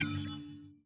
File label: ui negative hint